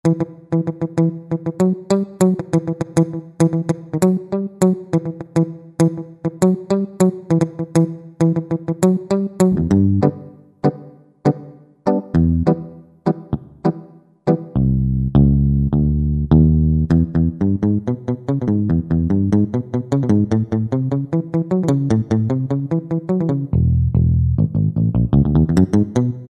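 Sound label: Guitar Big Mute, Yamaha EX5R; Factory presets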